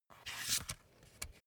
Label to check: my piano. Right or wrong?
Wrong